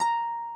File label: harp